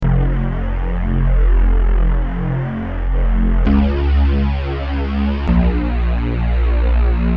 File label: bass